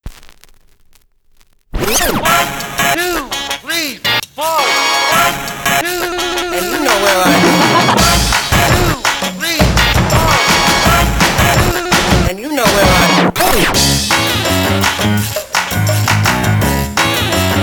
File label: Intro Cinematic; Smash Court 3; PS1